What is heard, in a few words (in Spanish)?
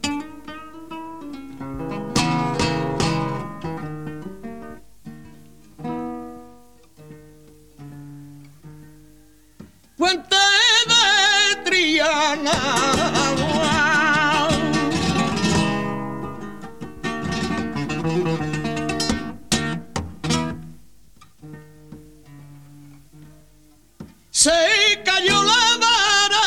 Solea, flamenco